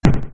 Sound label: hit; SniperLaya